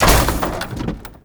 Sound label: Military Crate Destroy; Objects; Storage Container; Warframe